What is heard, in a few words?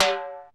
RX21L HI TIM; Hollywood Edge; The Big Bad Beatmachine